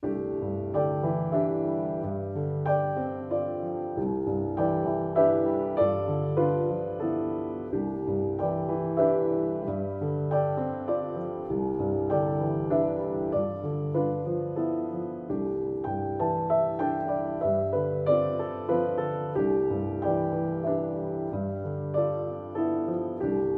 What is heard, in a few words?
Solo Piano